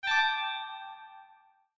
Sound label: emerald prize